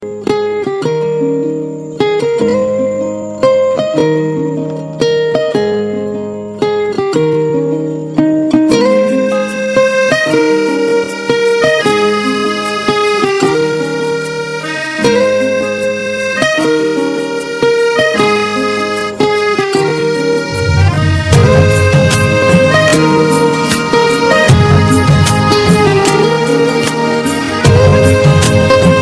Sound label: Sweet Music